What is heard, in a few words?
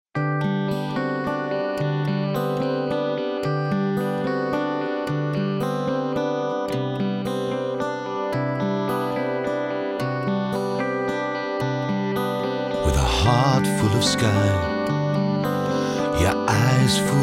bass